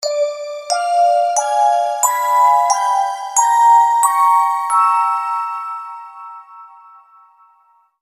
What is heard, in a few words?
melo doorbell